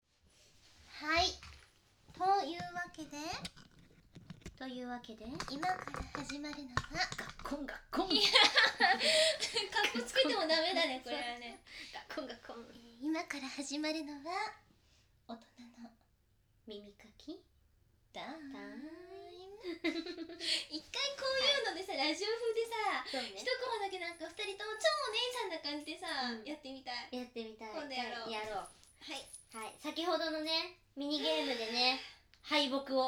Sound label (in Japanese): 勝者の耳かき, ASMR